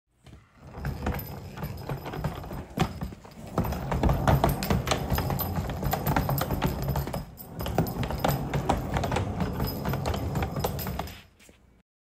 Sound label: Luggage wheels; چرخ های چمدان